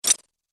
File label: Robotic Menu Click